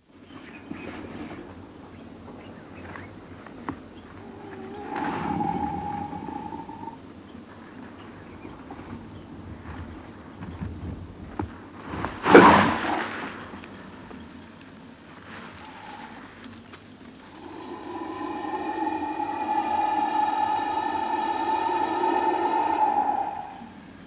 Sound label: croc